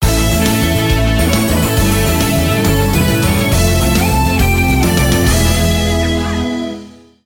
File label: level start